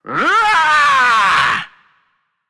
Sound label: battlecry; smite; voicepack; janus